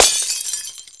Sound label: glass; Mafie 1